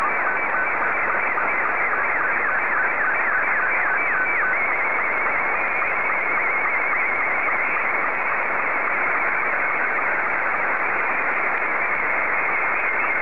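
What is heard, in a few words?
Scottie 2, slow-scan television; JL1FQZ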